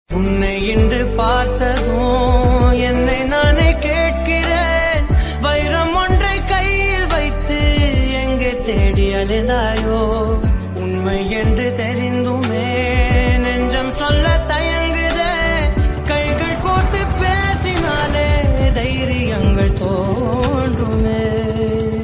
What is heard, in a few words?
tamil song; oh my kadavule